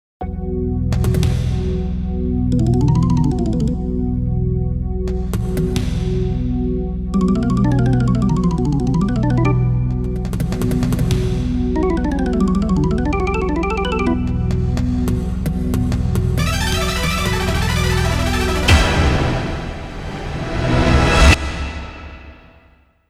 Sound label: suspense; Initial D Second Stage Sound Files